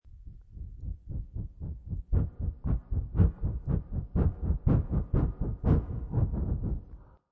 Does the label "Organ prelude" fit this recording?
No